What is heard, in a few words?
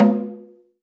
AFRO.TAMB, AFRO.TAMBORA; AKAI S-Series CD-ROM Sound Library VOL-5